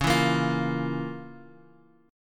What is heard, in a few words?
F Major 7th Suspended 4th Sharp 5th Db, guitar chord